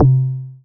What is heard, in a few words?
TOM, Yamaha TX7 synth drums